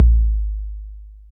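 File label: bd, 808 bass drum; Sound & Vision - Gigapack I; DANCE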